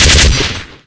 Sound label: sentry shoot, weapons; tf